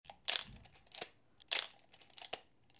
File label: Shutter Zenit; camera